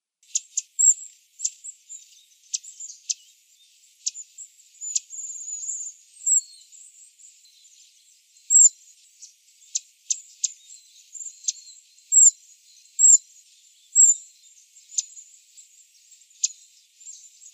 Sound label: red browed finch; ventemans track; natural environment